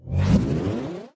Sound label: Sound Recorder Rewind; Xiaomi Mi3; ui